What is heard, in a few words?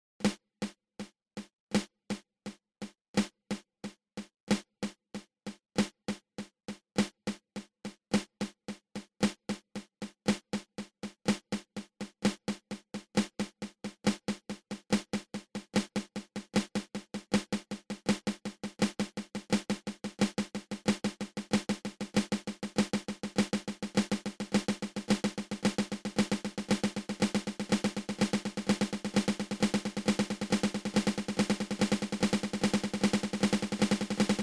Flammed Mill open close open